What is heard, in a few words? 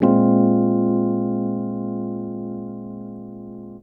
RHODES; Houseworx